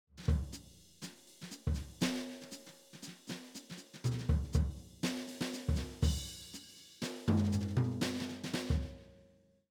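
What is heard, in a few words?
Jazz Brush, drums; Simmons SD1200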